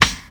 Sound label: noisy snare drum a sharp key